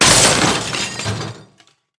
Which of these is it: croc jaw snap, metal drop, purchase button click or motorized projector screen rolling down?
metal drop